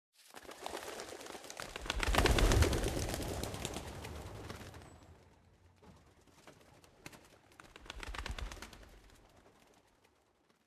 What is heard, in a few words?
ambient port; World Of Warships; port3d